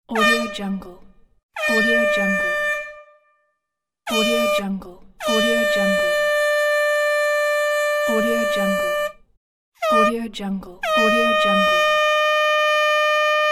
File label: football horn pack